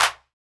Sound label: Claps